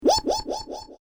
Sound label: Created Creature Small Ping, Alien Sound Effects; Created creatures, vocalisations and pretend speech